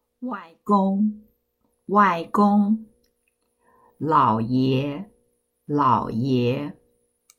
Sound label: Grand